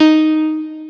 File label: piano